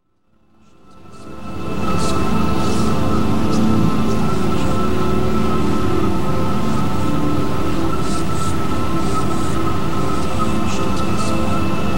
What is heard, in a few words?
noise of silence